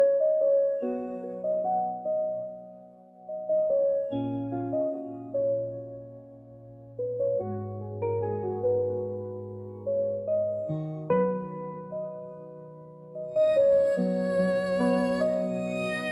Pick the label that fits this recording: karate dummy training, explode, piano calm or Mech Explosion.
piano calm